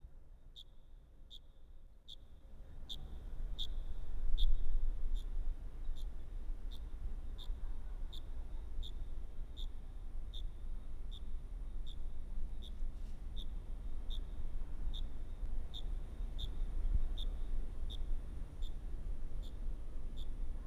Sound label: grasshopper